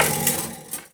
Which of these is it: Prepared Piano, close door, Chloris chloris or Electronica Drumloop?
Prepared Piano